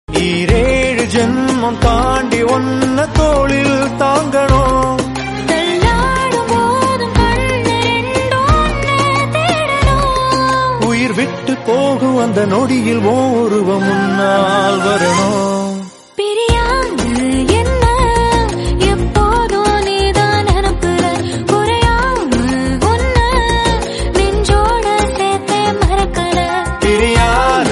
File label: Tamil Ringtone